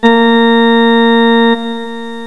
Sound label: ORGAN NOTE